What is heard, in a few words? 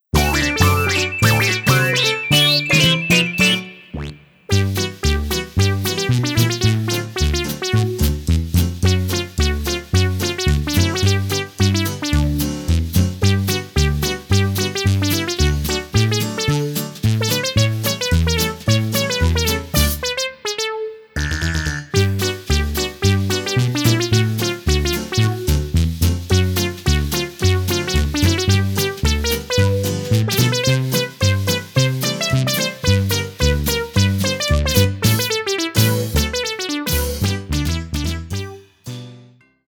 Moog; Forever Moog